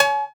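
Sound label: Synth Cowbell; One Shot Drums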